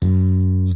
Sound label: Phat Slammin Hip Hop; InVision Interactive; 40 Oz Phat Slammin